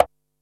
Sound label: KOMMERZDRUMS